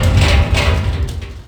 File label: Metal Barrel Slide